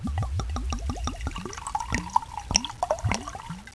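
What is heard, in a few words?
liquid pour; duke nukem total meltdown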